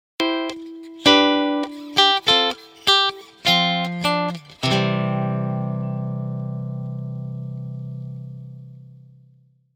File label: wedding melody